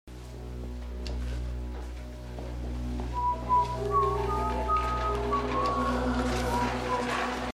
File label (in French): Siffle Symphonie N° 9 Hymne A La Joie; Reminiscence